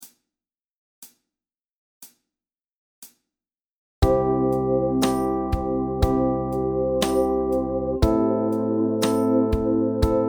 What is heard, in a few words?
Bass C2